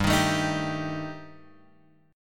G Suspended 2nd Sharp 5th, Guitar Drop D

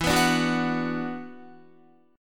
E 7th Suspended 2nd, down, Guitar; E7sus2; strummed

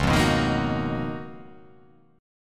C sharp 13th C sharp

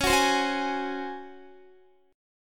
Db Major 7th Suspended 4th Db down Guitar

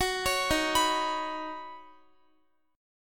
D sharp Minor 7th Sharp 5th D sharp, down, Guitar; D#m7#5; arpeggiated; 4E,4A,5D,5G,5C,6F; chord shape

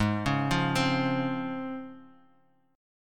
C sharp Major 7th

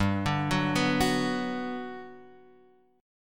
G Major 7th, Guitar, Open F; arpeggiated; JGuitar

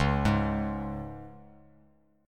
C sharp 5th C sharp; arpeggiated; C#5; JGuitar

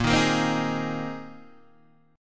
Bb 9th Suspended 4th Bb down Guitar Open D

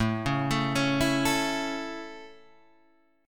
A Major A, chord; A Chord {5 4 7 6 5 5} arpeggiated

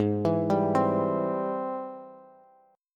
Ab Augmented Major 7th Ab Bass; arpeggiated; Ab+M7; JGuitar

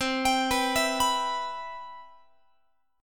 C Major 7th, Guitar; CM7 arpeggiated

